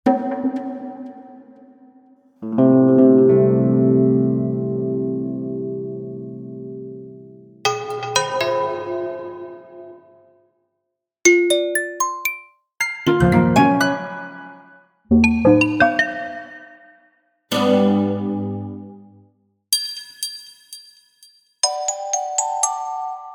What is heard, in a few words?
Musical, organic ui building game sfx; APPlicable Sounds